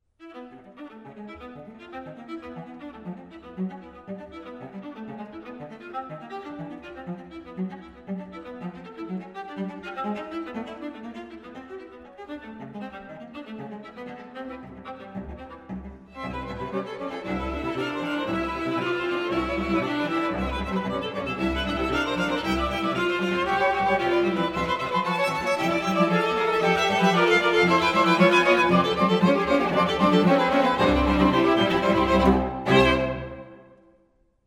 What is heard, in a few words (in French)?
Quatuor a cordes; Choveaux